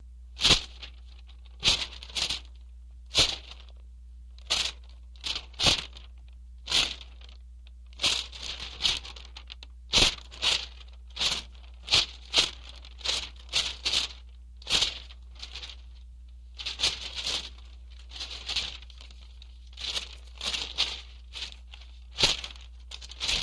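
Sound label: Book Handle Page Turn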